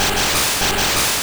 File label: Smog